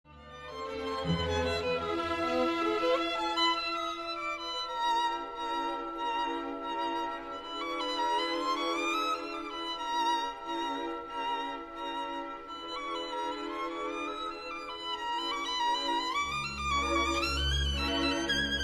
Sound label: Violin Concerto; Itzhak Perlman; Beethoven; Berliner